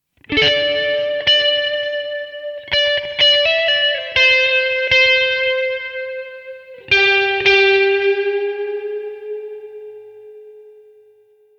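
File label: Lose, game music; Project Reality BF2 1.6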